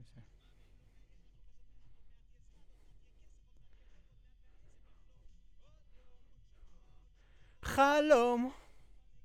B. Vox

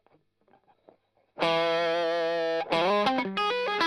Strum Line

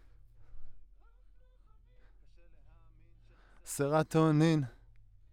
Voc OD

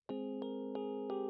Rhodes

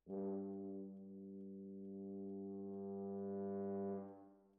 Brass

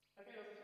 Spring

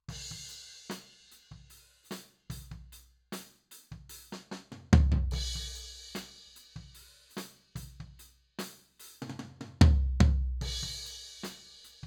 Floor

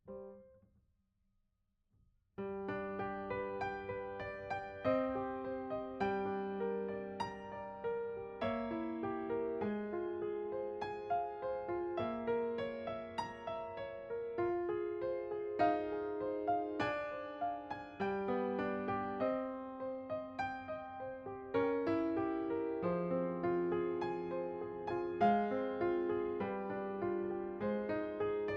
Piano B

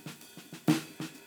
Ride

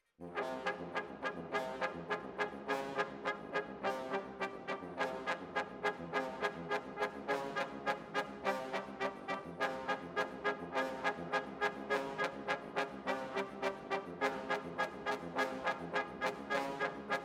Brass Over

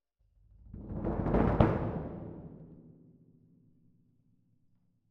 Cassa Main

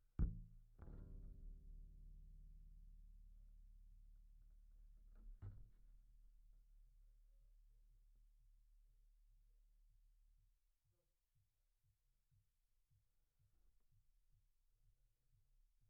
Bass DI

